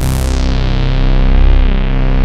FAT POLY, synth; Pro-Rec - Synthsonic; Z1 DANCE

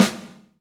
Rock Snare, Roland BK-5; Snares

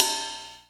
Korg N5 Rock Kit C#3

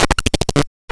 Trill, Drum Machines; Cwejman S1; AFG Prikle; 300 Drum Machines; DevSnd Cwejman S1 - JK

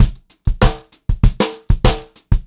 POP VARI, Pop-Rock Drumloops; USB Soundscan vol.37; AKAI; 96 POP

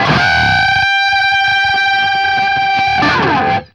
DBLE G 4 CUT, guitar doubles; East West; Steve Stevens Guitar Samples Collection

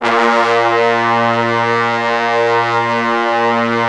A#1, Orchestral Brass; Best Service ProSamples vol.20; AKAI

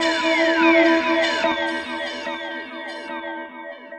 Bass Flute

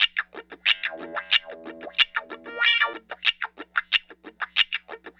Call And Response Guitar, 70s Analog Funk; Sonic Foundry (Sony Creative Software); What it Is!

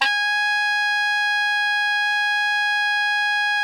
TENOR FF G#5, saxophone; Greytsounds; Philip Wolfe David Crozier's Saxophones

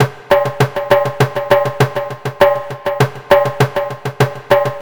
Tabla Fast, Acoustic Beats; Sonic Foundry (Sony Creative Software); Myths of Technology

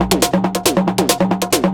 drum, 138, drum-loops, percussionism, Techno

